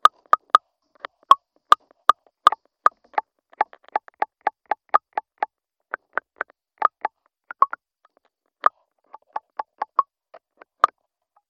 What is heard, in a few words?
plucking cactus